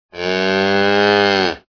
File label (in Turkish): inek zil sesi mo